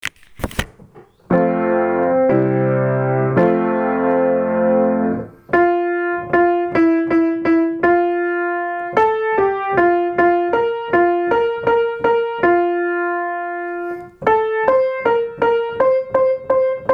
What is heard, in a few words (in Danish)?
tenor; Fuglene letter